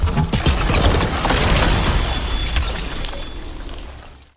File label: large attack; cryoclasm